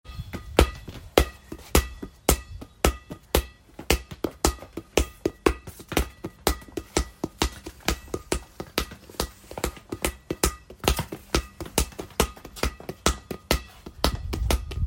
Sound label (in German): Ball springen; Ball dribbeln